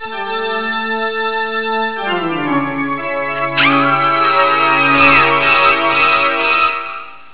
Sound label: organ Scream